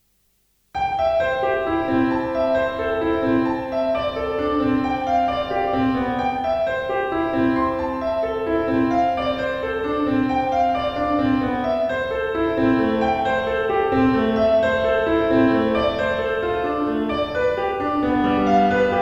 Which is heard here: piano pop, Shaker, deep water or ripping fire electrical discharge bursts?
piano pop